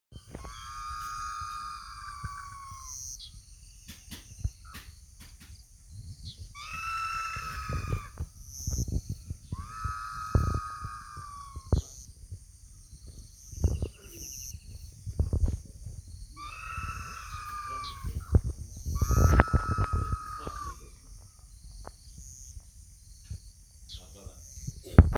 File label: White-shouldered Ibis Pseudibis davisoni